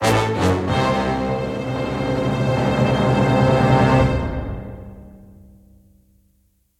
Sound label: Death